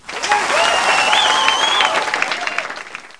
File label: cheer; Cocktail CD